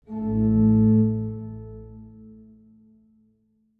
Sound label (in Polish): organy A1